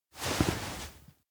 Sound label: look up sky; anemoi パッケージ版